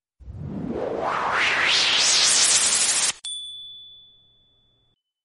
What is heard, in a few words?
robot xp